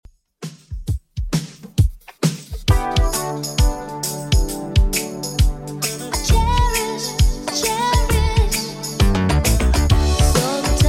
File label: 80s 133bpm; Redrum